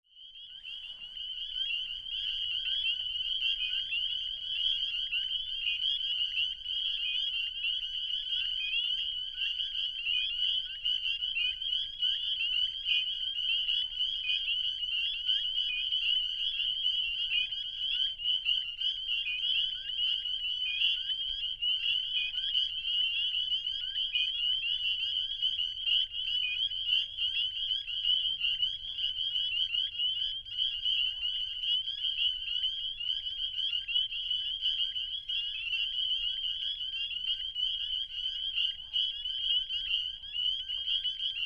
Spring Peepers Peepin'; Wisconsin 2019

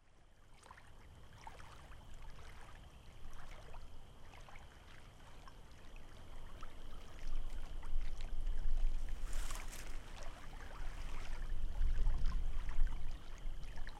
Lake Waves (Distant Loon), Wisconsin

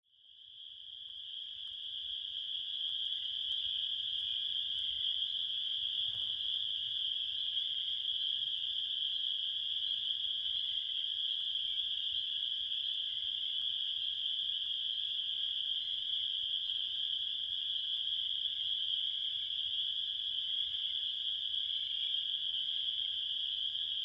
Marsh Sounds; Wisconsin

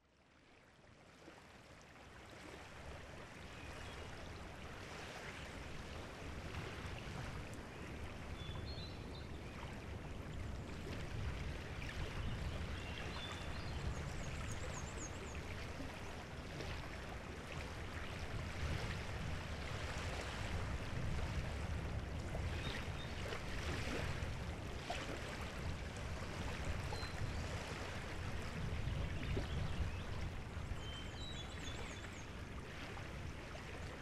Lake Waves; Wisconsin

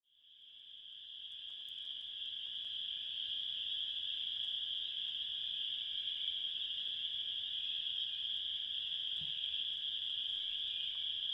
Marsh Sounds; Wisconsin 2019

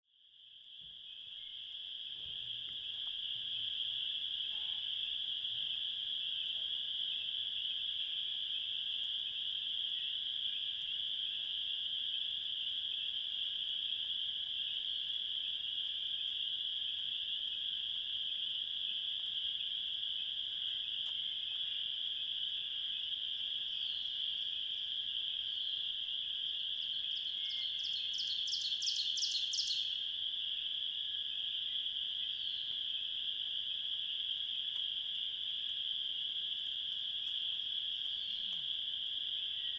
Marsh Sounds, Wisconsin; Wisconsin 2019

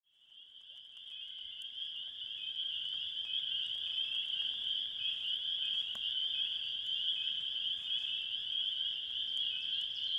Marsh Sounds; Wisconsin 2019

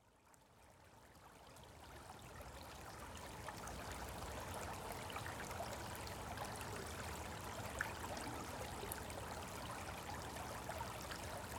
Nant Fawr woods stream

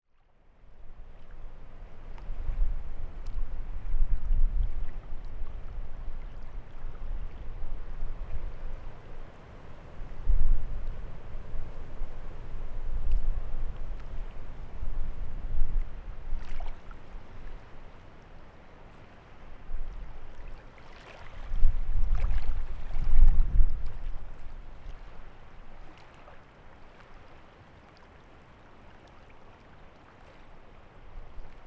Lake Waves; Wisconsin 2019

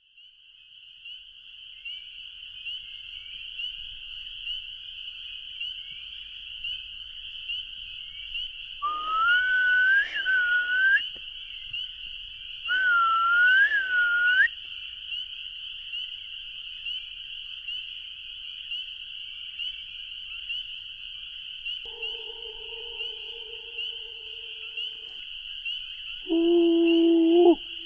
Mouth Sounds Night Lake; Wisconsin

